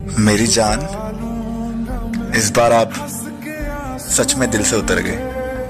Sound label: Breakup Ringtone